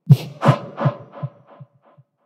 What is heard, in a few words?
Breathing